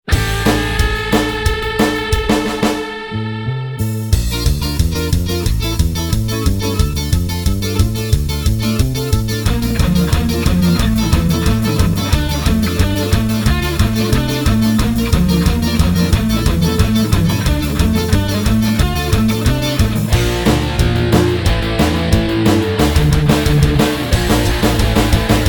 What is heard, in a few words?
minus, +1 semitone